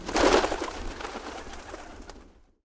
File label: bird